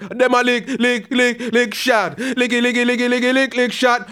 ragga in B phrase

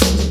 SD, Dance Mega Drums; Best Service; SD-HIP

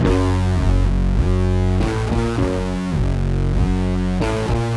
Bass FX

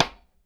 SIDE STK, LO-FI KITS; Spectrasonics; Burning Grooves CD2

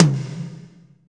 tom, Power Ballad; Northstar; Drumscapes Roland

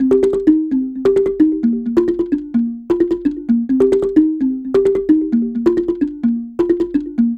Marimba 130bpm C, African; Quazibeat; African Secret

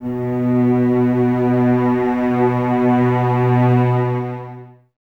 Cellos Expressivo, String Ensembles; Miroslav Vitous

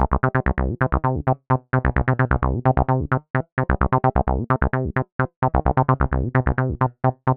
130 C bass, bass loops, trance; Trance Explosion Vol1

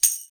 tamb hit short, Tambourine; Giga Samples Collection; Glasstrax

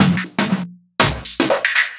Percussion, Trance NRG, Drums; Sonic Foundry (Sony Creative Software); Trance NRG VOL-2

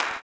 CLAP, blues & soul; ILIO; Vocal Planet

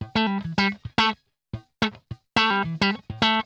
PLUK, Funk Guitar; 90 s Sample CDs; Zero G

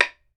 BAMBOO, World Assortment

